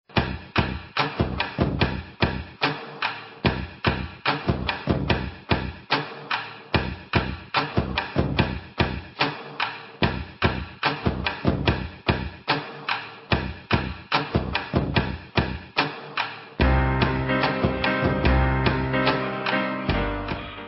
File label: amazing instrumental ringtone